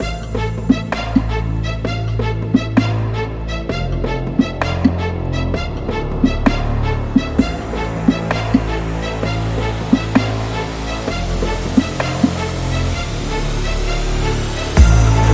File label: Epic Hip Hop Cinematic Trailer